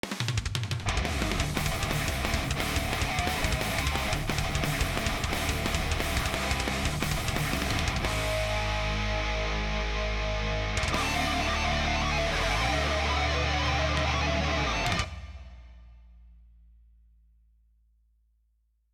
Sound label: Hammer; PAPER MARIO Color Splash; wiiu